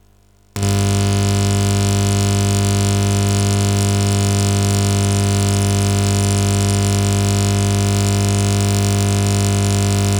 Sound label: Ground loop 50 Hz sound